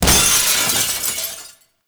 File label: Glass Window Break; Warframe; Nineteen Ninety Nine